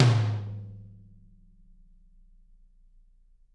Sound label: TT, Toms; Bob Clearmountain Drums I